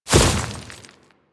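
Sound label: Step Vegetation, Footsteps; Warframe; Grineer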